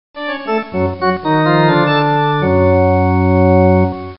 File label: RIFF; blood fresh supply